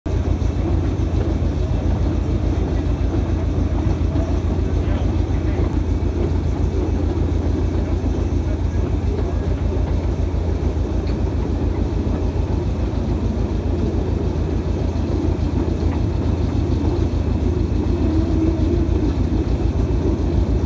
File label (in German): Weiterfahrt; Weiterfahrt Richtung Bf. Siebenbürgen